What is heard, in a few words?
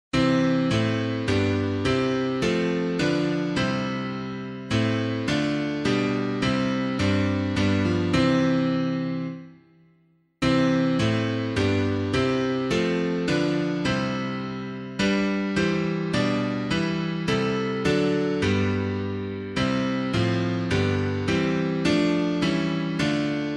Christ Whose Blood for All Men Streamed, piano